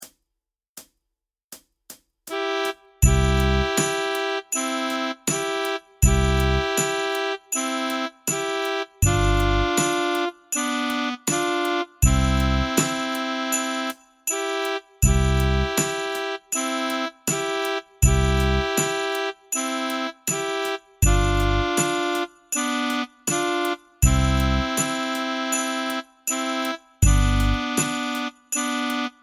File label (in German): mit Saxophon Tempo 80; Ihr Kinderlein kommet